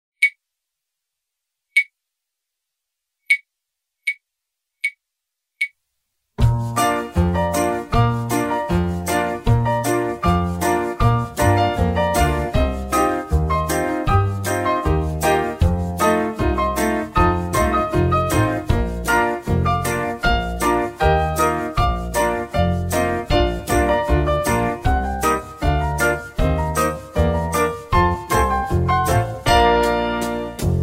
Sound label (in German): Treppe Hoch Und Runter Langsam, Bläserklasse, blechblasinstrumente